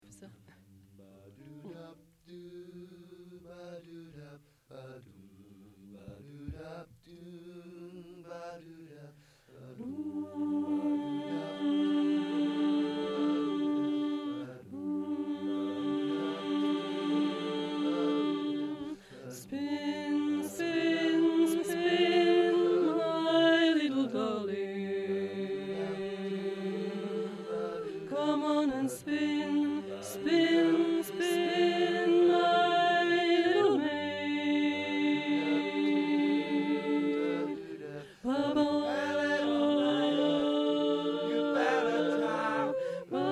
Spin Alto; training recordings

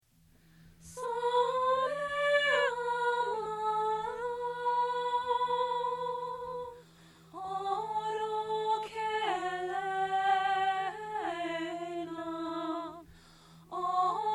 Ederlezi Soprano; training recordings

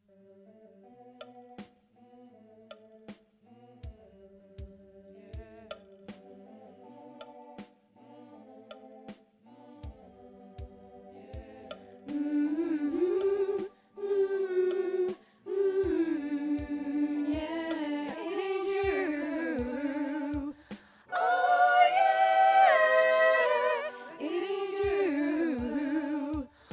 Soprano; training recordings

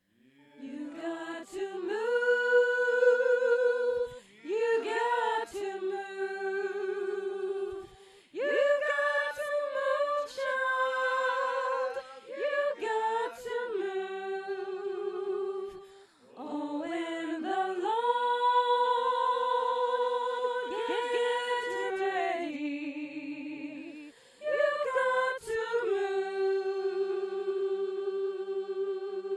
You got to move Soprano; training recordings

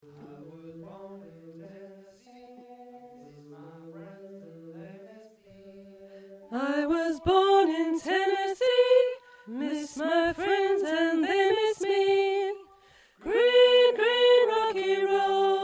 Alto; training recordings

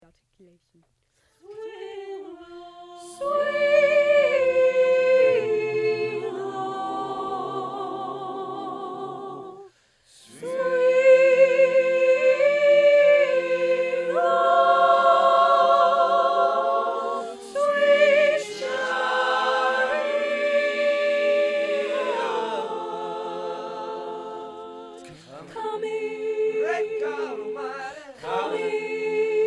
Swing Down Soprano; training recordings

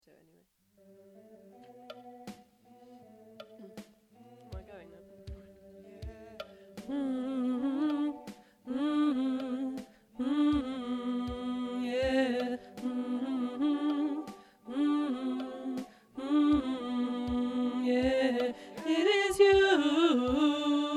Alto; training recordings

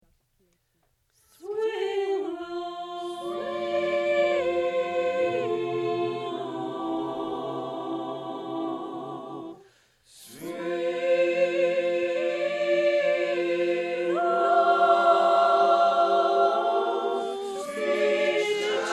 Swing Down Alto; training recordings

